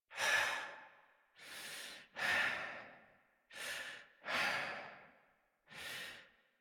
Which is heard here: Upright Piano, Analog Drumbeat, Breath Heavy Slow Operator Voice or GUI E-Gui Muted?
Breath Heavy Slow Operator Voice